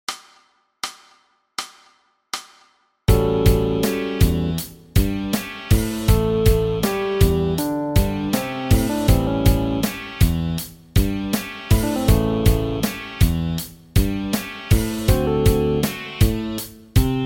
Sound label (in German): mit Bass